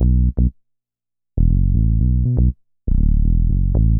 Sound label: BASS, DANCE; MAGIX